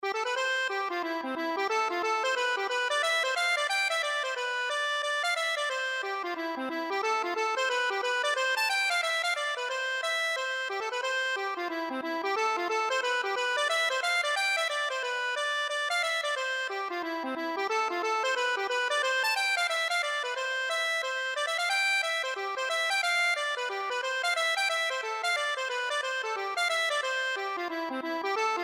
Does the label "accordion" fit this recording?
Yes